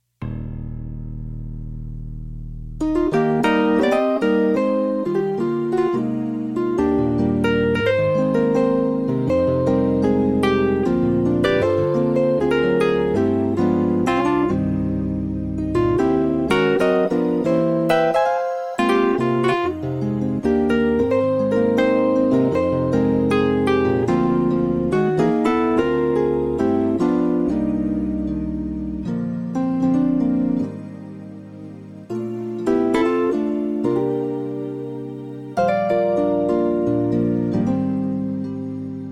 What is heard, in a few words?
Piano Session